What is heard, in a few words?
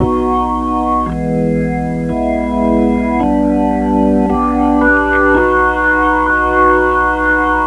Organ Lick; Hip-Hop; BillLaswell